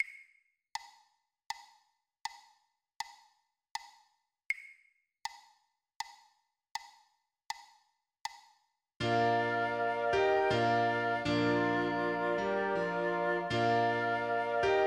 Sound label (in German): Flöte Klavier; Silent Night; 40 bpm, 2x; weihnachten